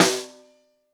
SN2 Bottom, Snare; Ableton Foundation FreePacks; Snare Designer

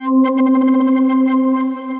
Ambient & Evolving; Ableton; Glitch and Wash

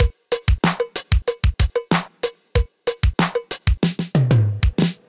Room Intro, Drum Machines; Ableton

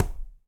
Quinto A Heel, Conga; Latin Percussion; Drums; Drum Hits; Ableton; Factory Packs

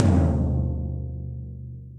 Tom Floor LowLoudSquashed; Drum Hits; Ableton; Factory Packs; Session Drums Club; MPE Hits

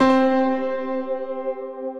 Grand Piano Thin Air; Ableton; Factory Packs; Grand Piano Hybrid

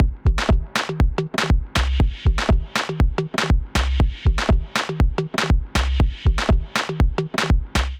Kit Melodic 120 bpm, Drums; MIDI Clips; Dear Ribane Kit; Ableton; Factory Packs; Skitter and Step

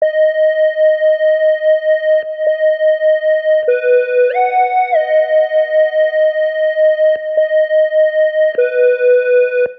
Sine Melody Ab Major 90, MIDI Clips, Melodic; Ableton; Factory Packs; Chop and Swing

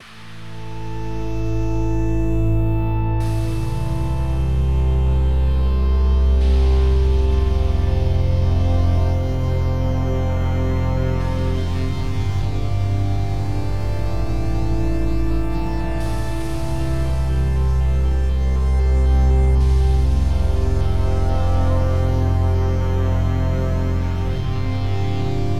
Epic Drone Pad - Amin 75 bpm, Atmospheric; MIDI Clips; Tonal; Ableton; Factory Packs; Mood Reel

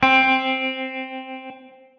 Guitar Soft Tremolo Room; Guitar Amped; Guitar and Bass; Ableton; Factory Packs

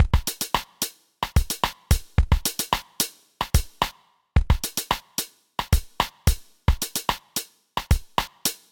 DMX 110 Rim; Drum Machines; Ableton

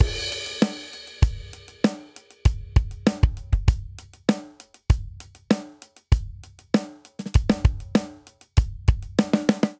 Straight Hip Hop Verse Variant 98 bpm; 4 4; Ableton; Factory Packs; Drum Booth

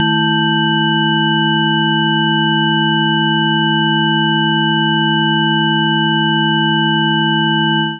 Exponential C1-F#6; Notes and Clusters

